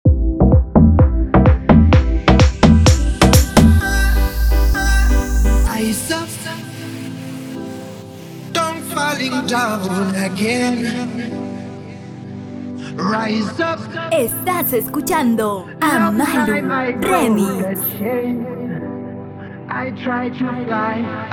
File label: Guaracha Remix 128BPM